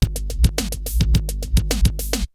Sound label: Drumloop; ZERO G - Datafile DISC 3